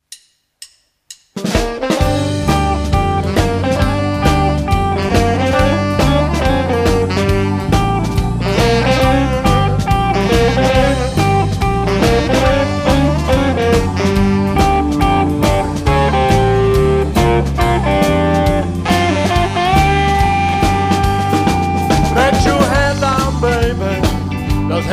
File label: blues